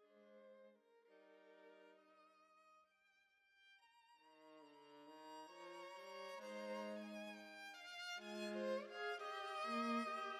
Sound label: adagio ma non troppo allegro; alessandro rolla